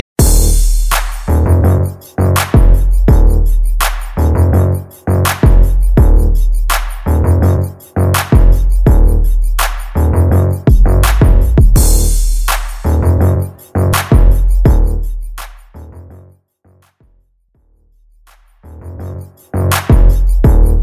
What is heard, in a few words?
82 Bpm; Mainstream VIP Extended Mix; Vegas Edit